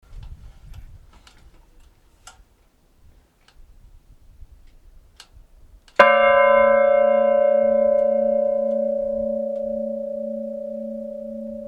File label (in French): cloche; Olmet